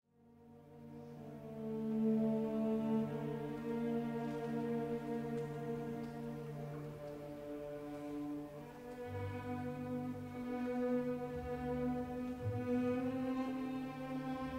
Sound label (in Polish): jak miękko, barwnie i ekspresyjnie brzmią wiolonczele; Barbirolli; IV Symfonia Gustava Mahlera